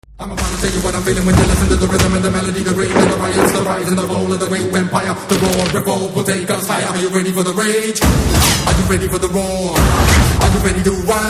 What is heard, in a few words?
Hindi BGM Theme